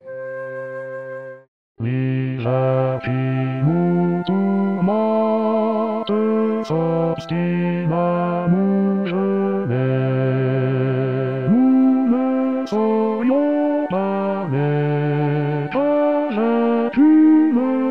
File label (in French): basse; Choeur de l'hiver